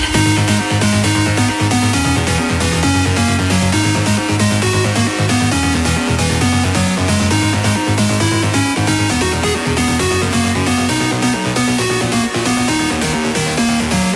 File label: trance